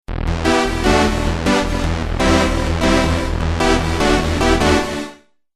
SYNTH ATTACK

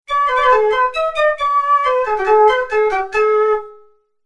FLUTE CONCERTO

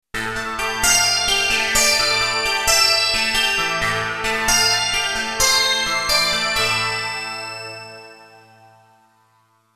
Analog Far East

